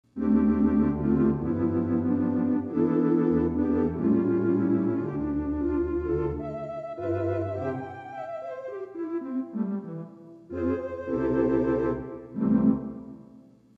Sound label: 8' Tibia 8' Diapason, theatre organ